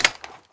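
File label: crossbow rechamber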